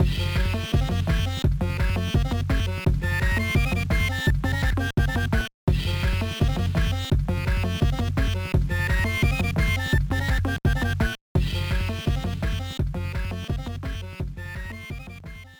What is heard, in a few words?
Melody of Passage; prince of persia the sands of time 2003 gba